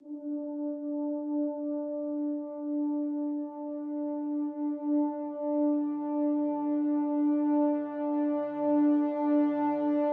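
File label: Crescendo Brass Swell Hi (D)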